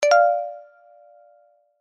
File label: notification